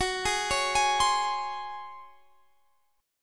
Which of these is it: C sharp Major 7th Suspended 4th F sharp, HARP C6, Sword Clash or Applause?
C sharp Major 7th Suspended 4th F sharp